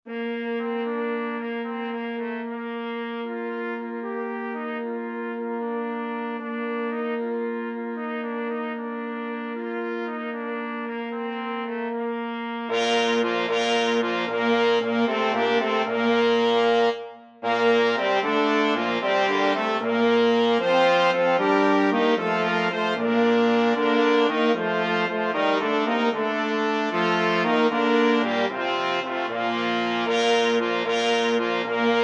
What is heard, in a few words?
BRASS, brass quartet